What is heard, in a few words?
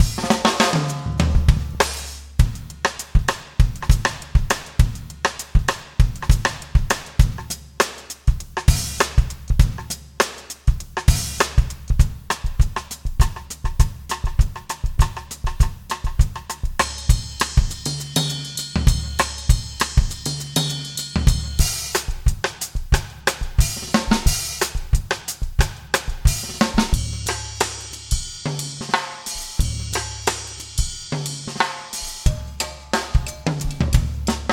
latin heat